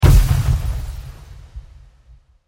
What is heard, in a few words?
barracks levelup; Might and Mayhem